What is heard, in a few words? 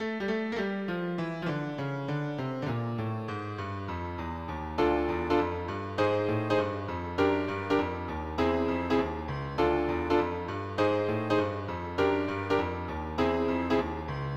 bass line on piano